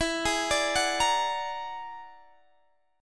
E 9th Sharp 11th E, guitar; E9#11 arpeggiated; JGuitar

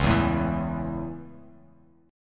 Db Minor Sharp 5th Db; strummed; Dbm#5; JGuitar

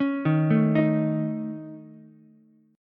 A Major Flat 5th A Ukulele, chord; AMb5; arpeggiated; JGuitar

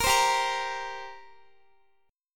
G Minor Double Flat 5th, G, Guitar; Gmbb5; strummed; x,x,20,17,19,17

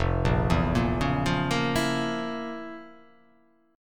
F Major 7th Suspended 4th Sharp 5th

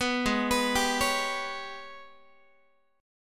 G sharp Minor Major 7th Double Flat 5th, Guitar; arpeggiated; JGuitar

